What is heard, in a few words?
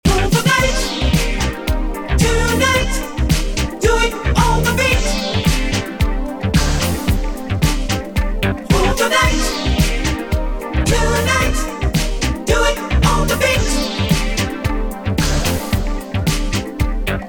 Sidechain New Kick ON